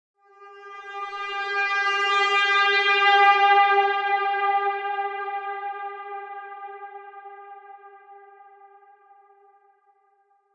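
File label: Stab High Frequency Electronic Horn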